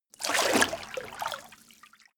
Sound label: water light